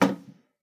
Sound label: Plank Wood Hard